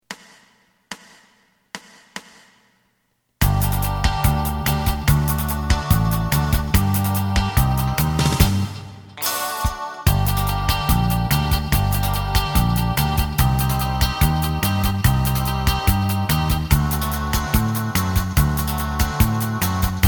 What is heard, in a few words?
lead